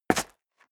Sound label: step dress boots concrete grit single left; Footsteps; schritte